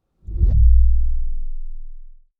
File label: Low, whooshes